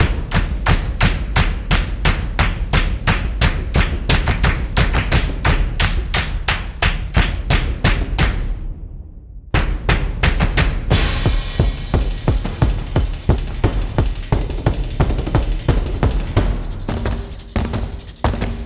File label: Just Percussion, Looping; Dungeons & Dragons